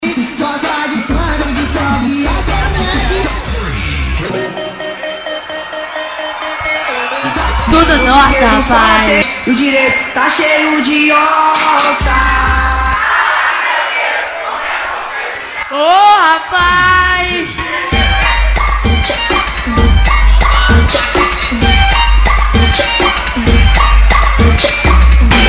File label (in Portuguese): abertura ao vivo